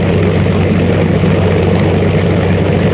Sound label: tank move; Army Men TiS